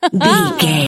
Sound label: voice female expression emote